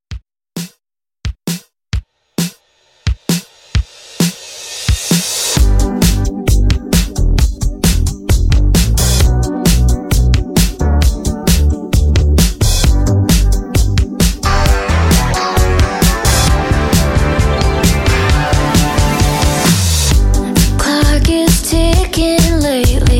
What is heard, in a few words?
Mixshow Re Drum 132